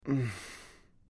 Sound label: Breath; Sigh, Light Male Moans And Exhales; Sigh, Light Male Moans And Exhales, X6